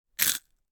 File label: Chewing Breadstick Single